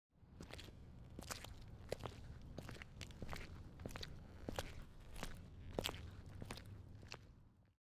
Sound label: Foot Steps On Cement In Squeeky Boots